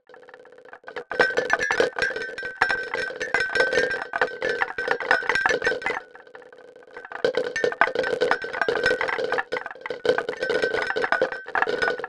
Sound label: neurons; ICAD2002